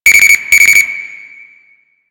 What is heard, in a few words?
Panel Alarm; Warframe; NineteenNinetyNine; Stage12PartyOfALifetime